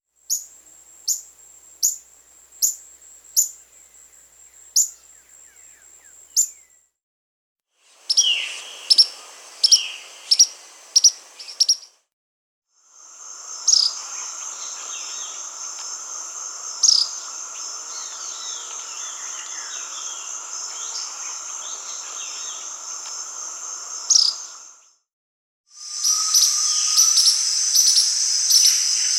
Spotted Tody-Flycatcher (Todirostrum maculatum), Peru; S. America